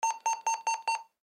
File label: Walkie Talkie Push Button Beep; Alarm; Beeps; Blastwave